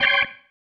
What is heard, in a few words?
Stab, Organ, One Shots